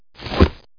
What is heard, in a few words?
Drop equip